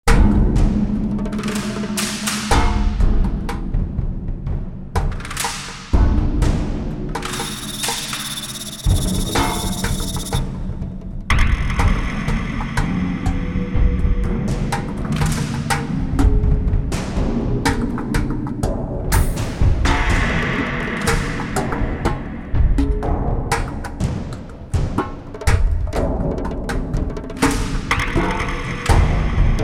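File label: Battle Loop Rural Percussion; Fallout New Vegas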